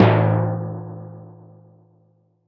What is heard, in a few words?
Timpani, Percussion